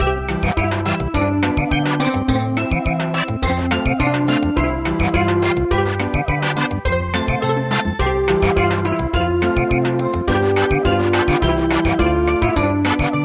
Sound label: Samba